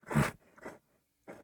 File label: horse pair vehicle gearshift